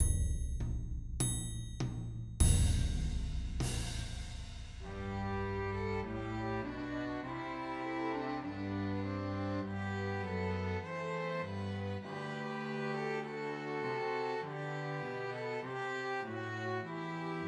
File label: See Amid the Winters Snow, Christmas Trios for Two Violins and Cello